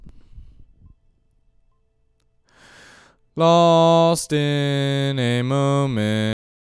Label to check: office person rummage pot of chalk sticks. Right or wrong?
Wrong